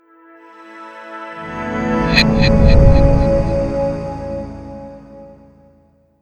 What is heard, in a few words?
windows